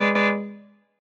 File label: Hover Car Horn; android; Does Not Commute